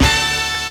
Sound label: HORNHIT; E-Lab; X Static Goldmine 2; akai